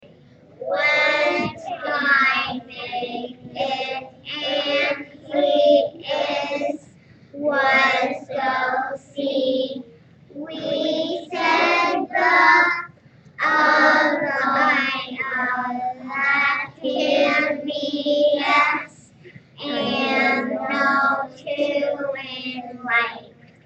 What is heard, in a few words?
sight word song backward